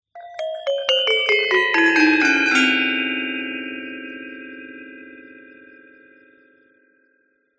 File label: musical instrument gender small gamelan glissando downward